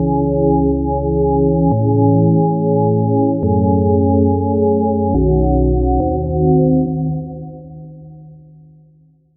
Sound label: C Minor Prog